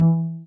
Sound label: soft hitwhistle; osu